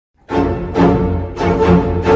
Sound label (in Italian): suono quarto grado